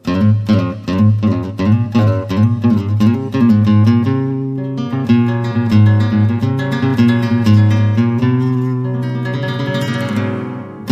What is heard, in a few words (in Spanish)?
guitarra flamenco